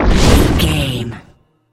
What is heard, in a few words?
bullet leave barrel slow